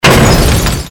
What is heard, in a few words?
good die; CAC ASSETS